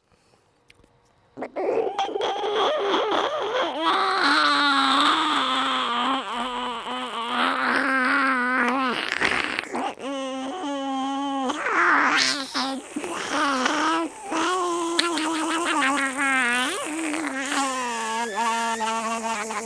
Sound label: small insects and insect